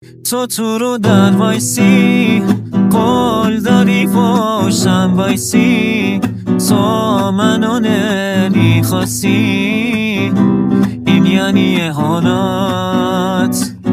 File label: Guitar